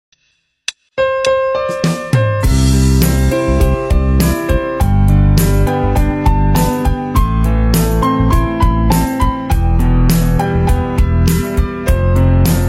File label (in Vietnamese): nhac chuong chill khong loi, nhạc chuông